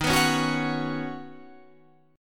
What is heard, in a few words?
Eb Minor 7th Sharp 5th down Guitar Standard; strummed